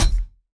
player mvt grab player

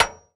Hit Sheet Metal

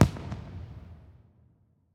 Fireworks

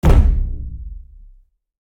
RC Ball Hit; Scraprun; Deathrun

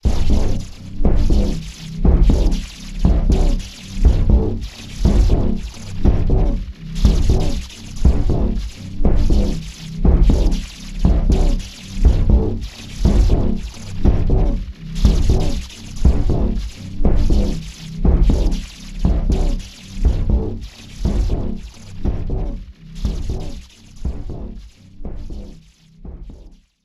Ready Loop; Heartball

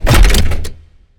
tackle; Player Tackle

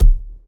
Ball Player Hand Hit Tackleball Med

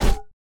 Baseball Bat Big; Wieldables

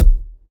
Ball Player Hand Hit Tackleball Hard

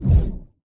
Hand Paddle Release Med

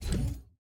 Hand Paddle Release Light

